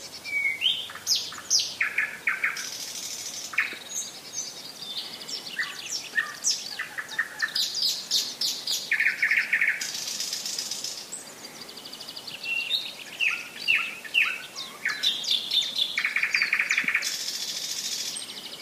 Sprosser, Moos; Murnauer Moos GAP; Christian Haass

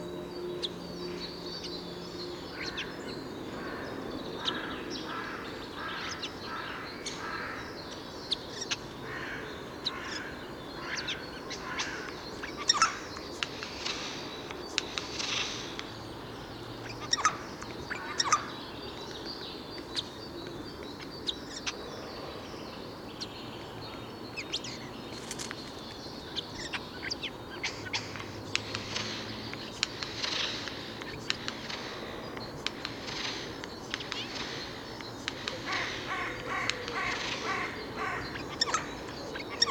Eichelhaeher, Gesang; Michle Pron

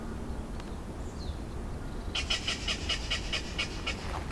Zwergdommel; Echinger Stausee LA

